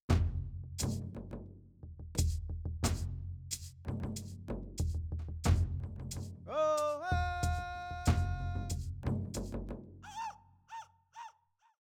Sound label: Simmons SD1200 Perc Native American